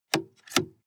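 sports car interior ventilation adjust hard double; verkehr transport; autos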